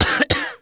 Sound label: Human Male Cough Two Times